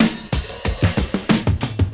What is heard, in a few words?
drum chop; AMG Gota Yashiki Groove Activator